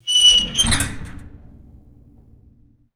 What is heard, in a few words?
metal fireplace door opening and rattling; doors